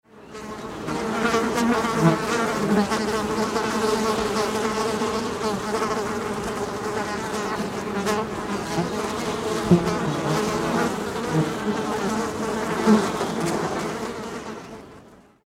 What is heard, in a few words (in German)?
fliege; was summt